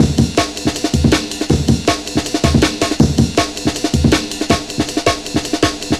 PLECTRUM, breakcore 160BPM

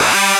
RAZOR RIP; E-MU Producer Series Vol. 2; More Studio Essentials; Composer; Technopourri

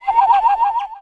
WildFX, African; Quazibeat; African Secret; SFX

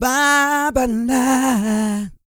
SING, Vocal Planet R&B & Dance; ILIO

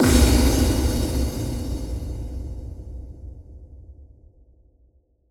Impact

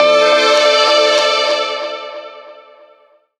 RAW STRING FX; Rawcutz; Nu Forms

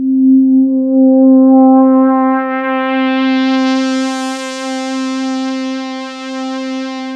MKS80 SWEEP; Rubber Chicken Software Sample Bank

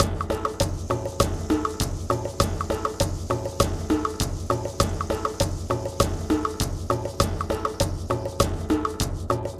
Cave Ceremony, percussion; Sonic Foundry (Sony Creative Software); Joe Vitale Latin Percussion

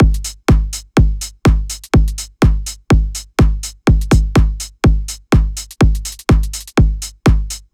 House Drum Loop 124 BPM; Dance; 99Sounds Music Loops; 99Sounds x Monosounds

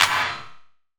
Clap, Gated Reverb; creative gate; musicradar

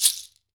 TANZANIAN, African Perc; E-MU Formula 4000 Series Vol. 4; Earth Tones

